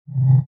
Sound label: Whoosh Short And Subtle